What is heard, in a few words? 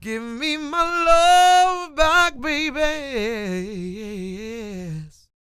SING, Vocal Planet Blues Soul BLUESY